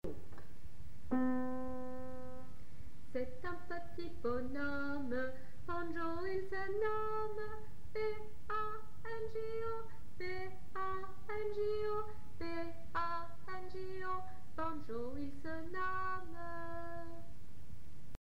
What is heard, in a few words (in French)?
banjo